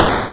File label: statue hit